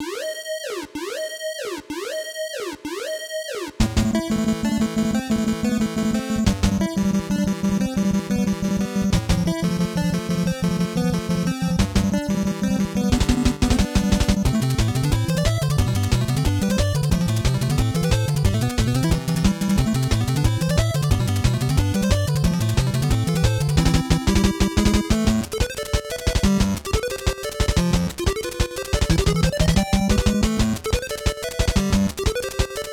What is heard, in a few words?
Alert; Metal Gear